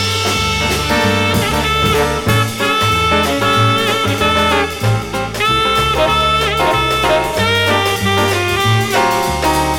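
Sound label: minor-key agressive bossa; first chorus of exchanges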